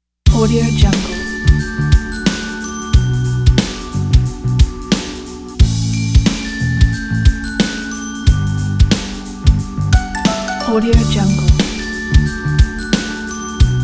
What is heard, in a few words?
happy xylophone